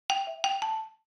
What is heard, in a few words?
Marimba alert message